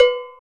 PER COW, Cowbells

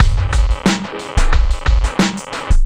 Street Tip, R&B Drums

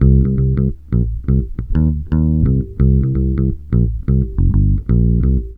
TOUC 86 CM7, Bass Grooves; USB Soundscan vol.30; AKAI

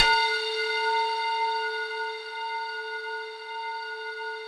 RESO METAL A4, Yamaha VP-1; Music Store; 90's Sample CDs

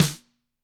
GRCH LO, GRETSCH SNR; ILIO; Double Platinum Drums 1

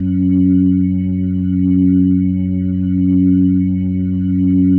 Single Note F#1, Organ; Loops; Single Notes; Sonic Foundry (Sony Creative Software); Joe Vitale Organ Donor

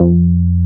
CROMA, Synth Bass; BA1 Synth-Basses; Sound & Vision - Gigapack I CD 2

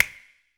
HMM, Human Perc, Body Perc; Spectrasonics; Supreme Beats; World Dance

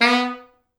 B2, Pop Brass; Best Service ProSamples vol.19; AKAI

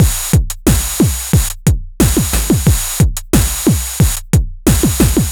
Tape 90 bpm, retro drum machine samples, Loops

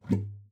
GUITAR FX; Xsample VOL-2

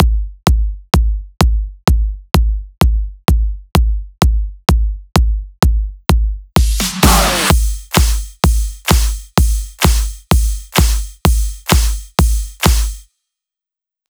128BPM Full Drums; Vengeance - Essential Clubsounds Vol.5; Blue Eye Kit; Root G; Drums & Perc

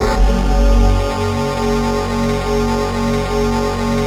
synth clav

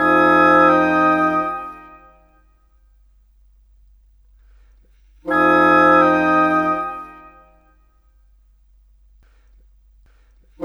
Cinematic Winds; Cinematic 06; Orchestral Series

